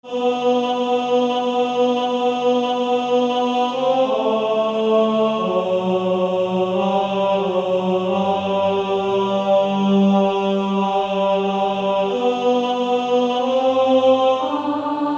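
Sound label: Male Choir, tenor; Onward Christian Soldiers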